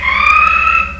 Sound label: trill